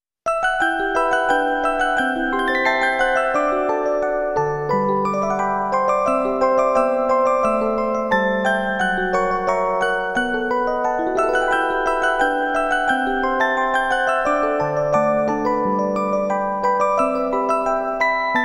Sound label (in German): Orgel